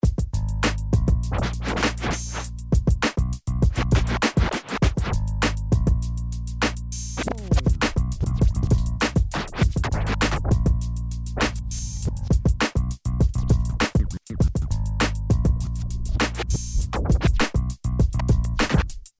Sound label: Loop Scratch